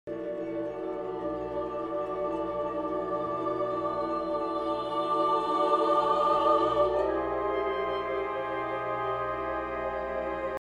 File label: Clocks And Clouds; Ligeti